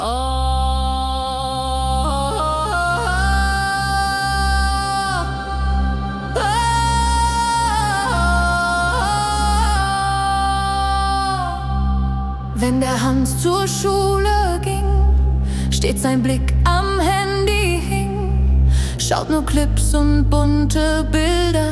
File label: Techno; Hanns der nur Handy schaut